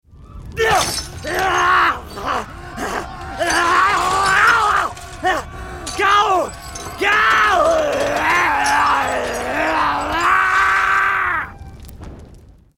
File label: Turning Zombie; death sounds; efforts